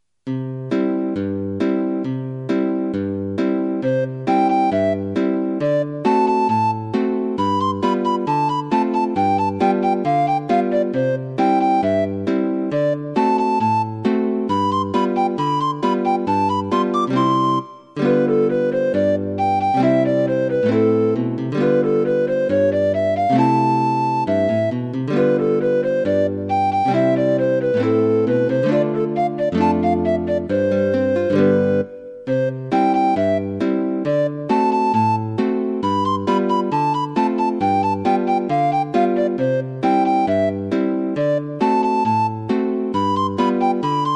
etude gt

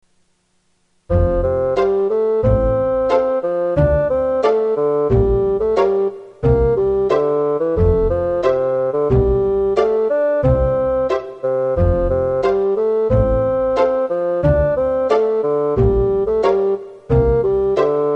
opening pizz fag

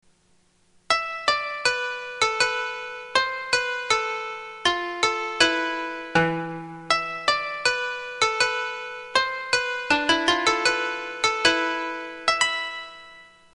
opening koto

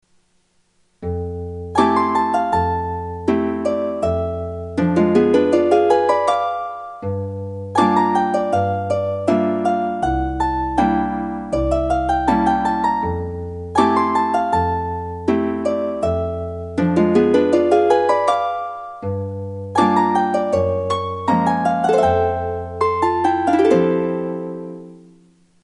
opening jazz hp

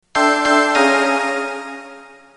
alarm

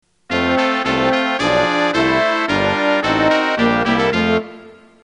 opening brass

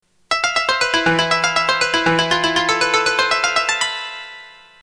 opening koto

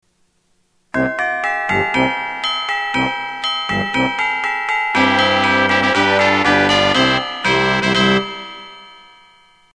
opening tubel brass

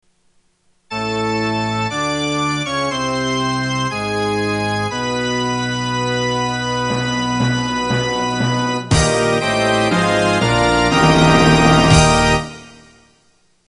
quiz ok

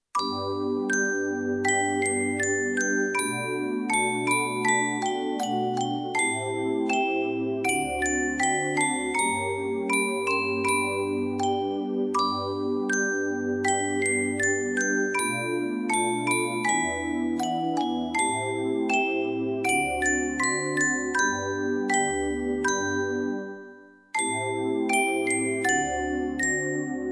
handbell

